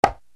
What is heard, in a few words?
RX7 CONG H M, Yamaha RX-7